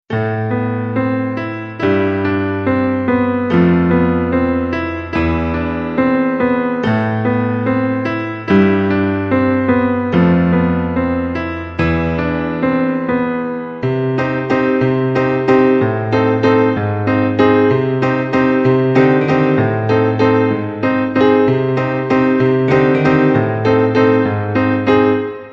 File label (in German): klavier